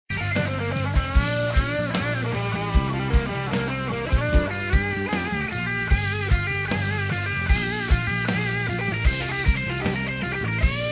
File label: guitar solo